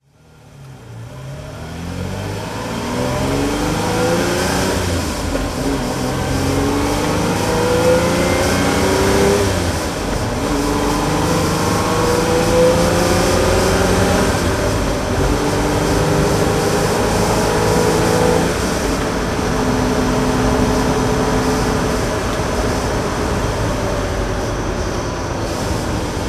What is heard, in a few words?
ferrari 330gt blue driving